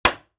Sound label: Nail Wood, Crafting; Survival Game Sounds - Lite Edition